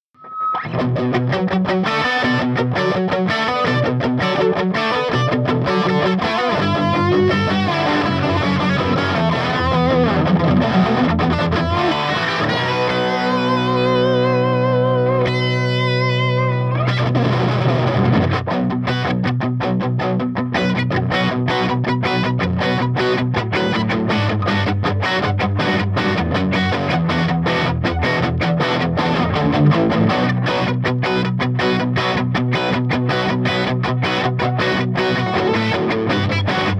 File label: LP Dirty; Trinity Triwatt Amp